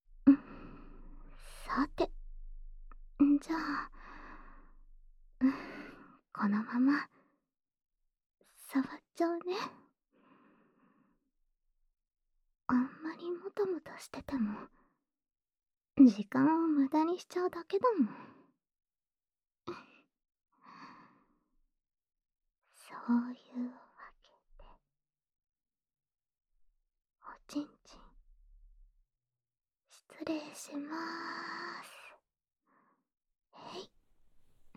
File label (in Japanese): 唾液ディープキス＆指コキ, ASMR; キミの弱いところ、カリカリシコシコしてあげる♪